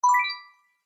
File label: chimes